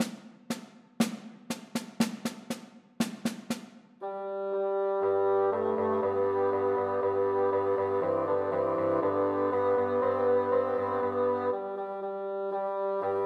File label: Christmas Trios for Three Bassoons